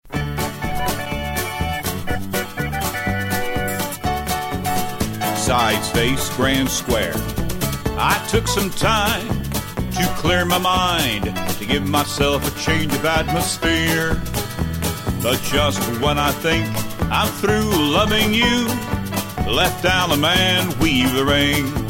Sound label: vocal